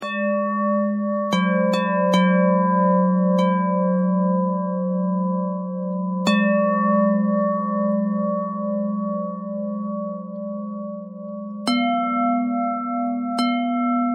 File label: bell thread pentatonic